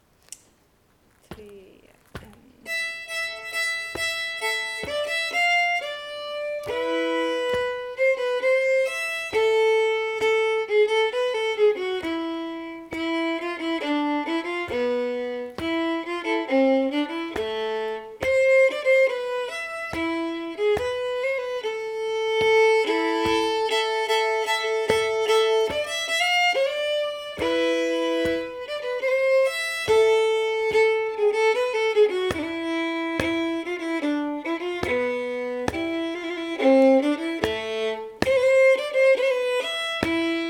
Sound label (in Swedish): Lillpolska långsam